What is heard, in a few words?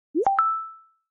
text message sound effect notification